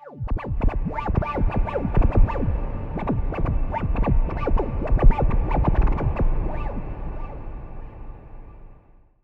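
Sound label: Virus echo wibble; transition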